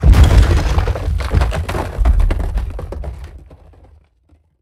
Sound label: Rock Prison Collapse; Evolve; Behemoth